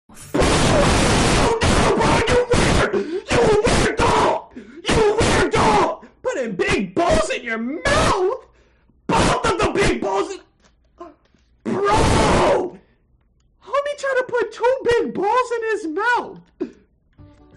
dog; wubzzy

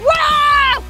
wahhh

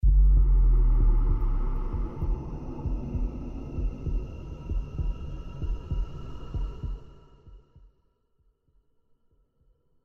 alert mummy

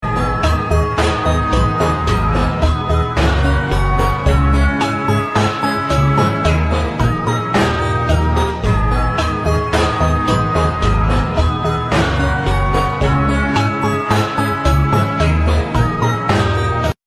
lose slow; brawl stars

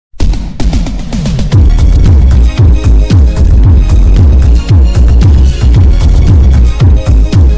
bass boosted

